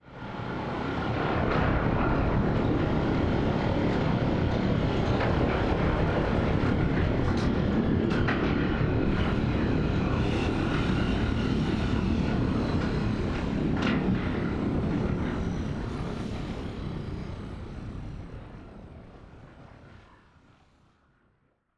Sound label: ship colossus closing; Stellaris; machineage